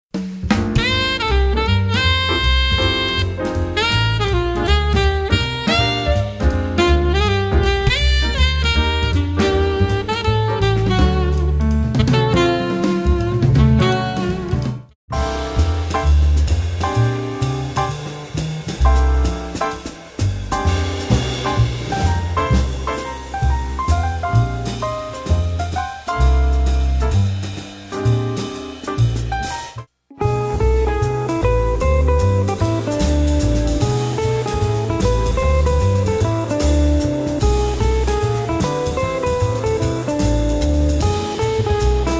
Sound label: Jazz sampler